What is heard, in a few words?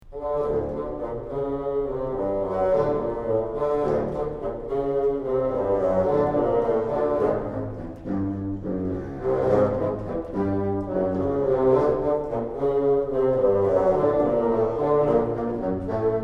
Blues sample